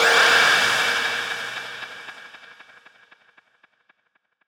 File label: fx impact something out there